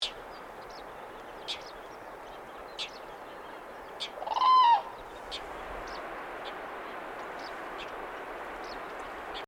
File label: black necked crane